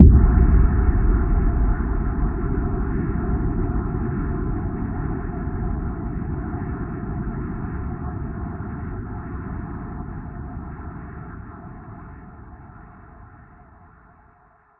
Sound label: Drilling Implosion; Plastic Surgery